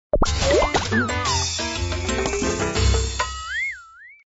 lose

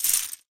coin collide

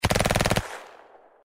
Enemy Shot

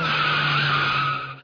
moto brake

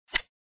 click Area

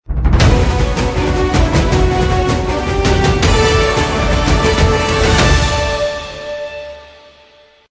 Bigwin music

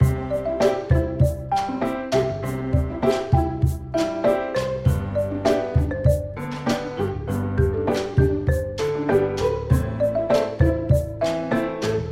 bgm

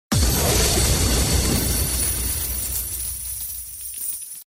Bomb

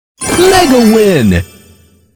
mega win

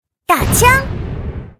shoot main

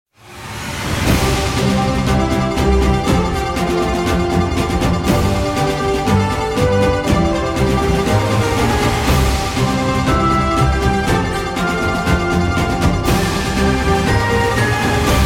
big start

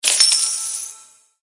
show Score

